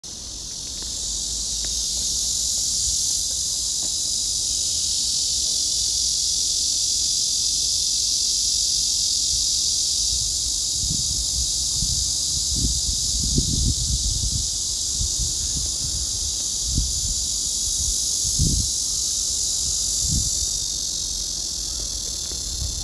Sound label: cicada